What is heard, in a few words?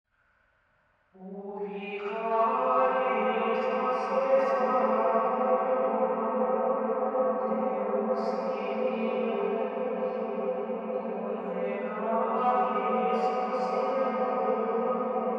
convolution reverb singing; Inchintown